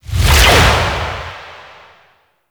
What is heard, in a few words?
Charge; Warframe; Infested Lich; Hard Drive